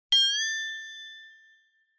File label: Accent